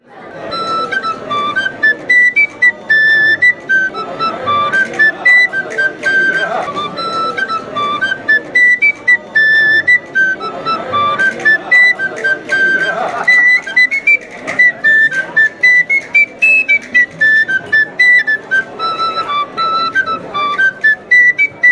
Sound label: Tabor Pipe